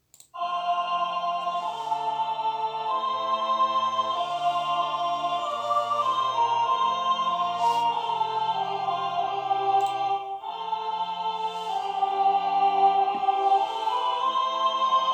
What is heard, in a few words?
soprano; Sanctus